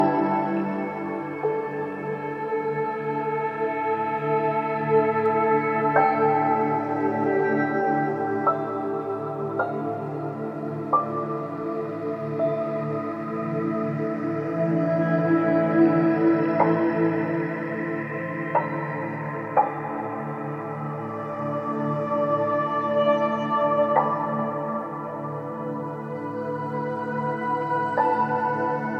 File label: PlayStation 4 Menu